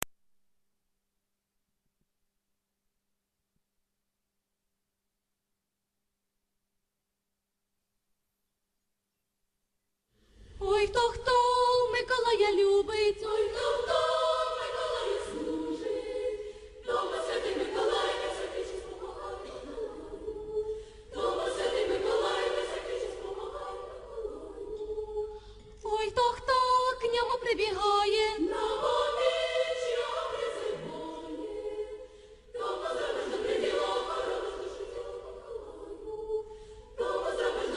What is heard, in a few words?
16 century Ukrainan song; St Nicholas